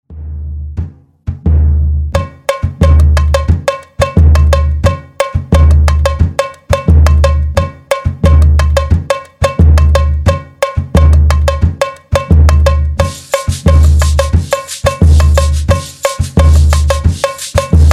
SAMBA (45BPM)

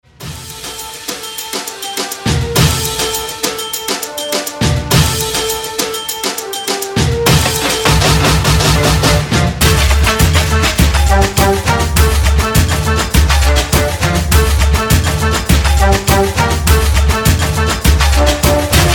SAMBA - Trumpet (51bpm)

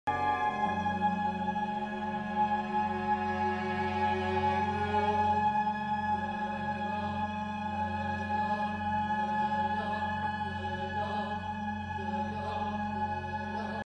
Clocks And Clouds; Ligeti

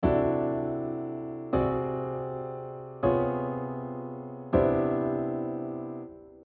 pedal point - anticipation

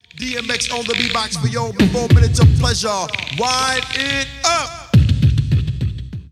Vocals ('wind it up!')

